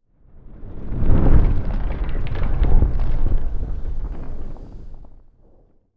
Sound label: ice asteroid; ambient; Stellaris